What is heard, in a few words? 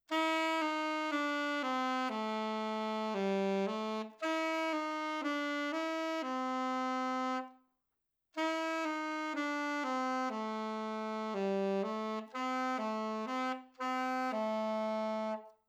Yamaha 4c, sax